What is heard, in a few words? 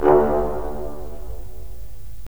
BRS, Roland LA Composer